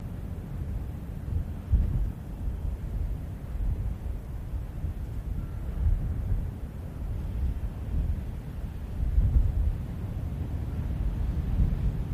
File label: Port BG Gulls Fog Horn, harbor bg